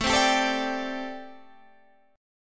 A Minor 11th A, Chord

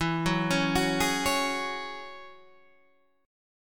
F sharp 7th Suspended 2nd E

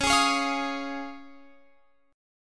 Db Major Add 9th, Guitar Open F; strummed

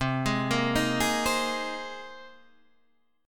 C Minor 7th Sharp 5th, Guitar, Standard; arpeggiated; JGuitar

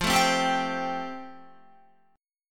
F Major Add 9th, Guitar; strummed

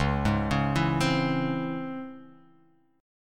C sharp Minor Major 7th C sharp, chord

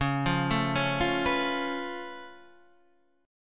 Db Augmented 7th Db down Guitar Standard; arpeggiated; JGuitar

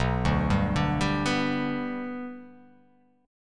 B-Minor Sharp 5th

